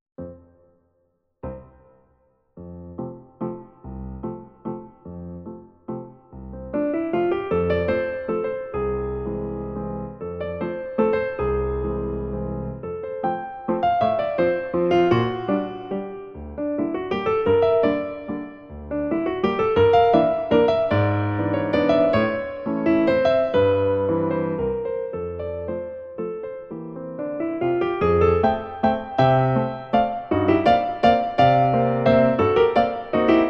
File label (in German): Lyrischer Walzer BH schnell; Schostakowitsch